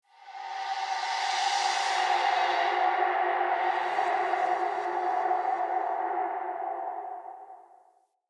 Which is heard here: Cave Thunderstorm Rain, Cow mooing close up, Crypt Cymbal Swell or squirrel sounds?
Crypt Cymbal Swell